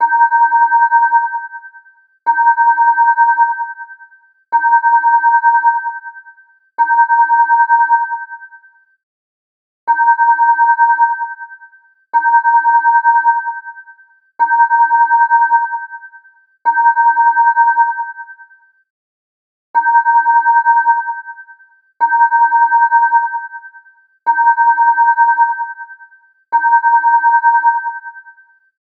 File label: Simple Bell, ringtones; LG; X Screen